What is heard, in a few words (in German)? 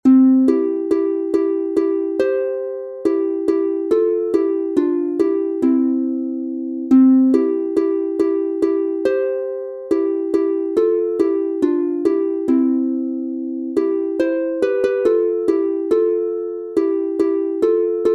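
C Dur 2 stimmig instrumental, Harfe; Morgenlieder; Wach auf meins Herzens Schoene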